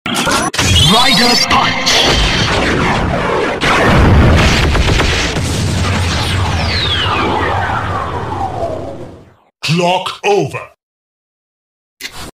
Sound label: punch